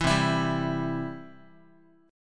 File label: C Major, Guitar; strummed; 7,8,7,5,x,x; 4A,4E,5A,5D,5G#,6C#; chord; JGuitar